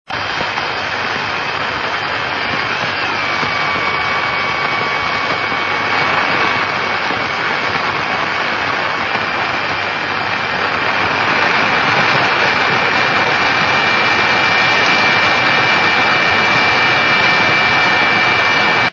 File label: 29 mhz beacon; ao-5